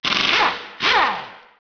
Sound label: aahh